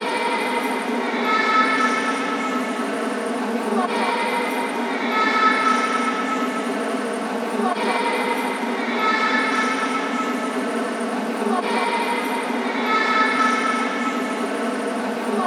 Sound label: Texture